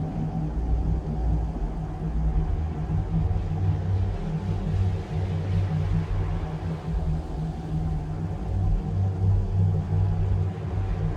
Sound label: ship construction ship idle; Stellaris; machineage; ships